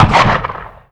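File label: javelin fire distant; Modern Warfare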